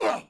Cbmn Hit Vox; Civ4 warlords

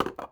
ui drop can full; Rust

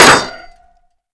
physics knife painted metal

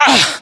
pain, voiceovers

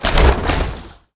tent; World of Tanks Blitz; objects ordeal

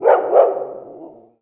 dog; Call of Duty Modern Warfare

